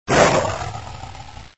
Tiger Pain

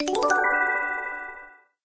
Score Fly; Farm Mania 2

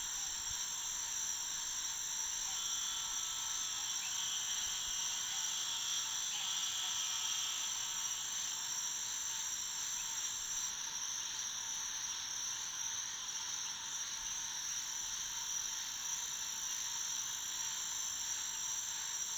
Hub Swamp Cricke, Swamp; Levels Hub Swamp 02 Cursor Potion; Hub Swamp Cricket Amb

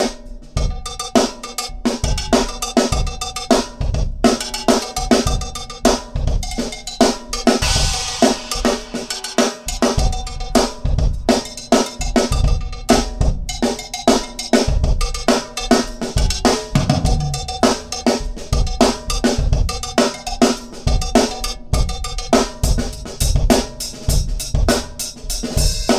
subway play for tips drums; The Sims 3; windows gamerip 2009

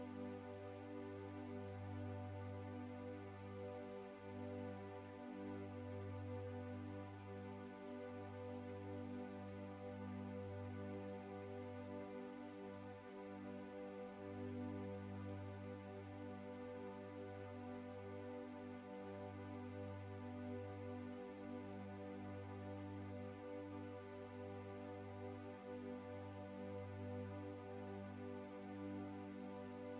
Stinger Gameover Positive Loop

